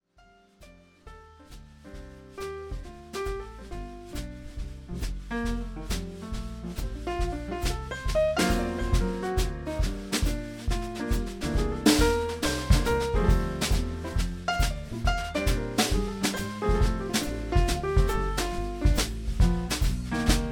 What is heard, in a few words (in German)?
Hoeprobe, piano